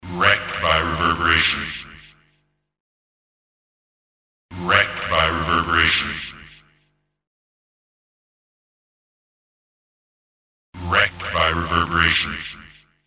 Reverberation, voice FX, industrial

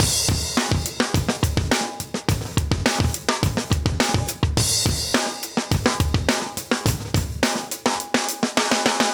105 live breaks

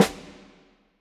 Snare, Orchestral Percussion One Shots; Sonic Foundry (Sony Creative Software); Orchestral VOL-2

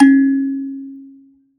Finger, Prepared Kit; Battery 3 Sample Kits

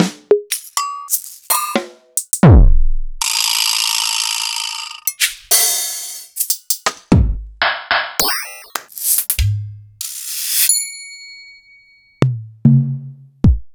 EMU Orbit

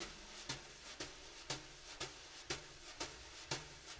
BR, BRUSH LOOPS; Sampleheads; Peter Erskine

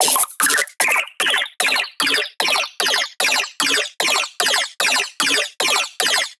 bass loop hardcore layer 150 D#; Virtual Riot

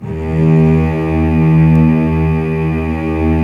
STR LUSH S, MIDI Section

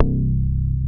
PERKY MOOG C2; East West - Global Sounds

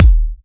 Bassdrum

